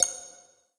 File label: Complete Check; blue archive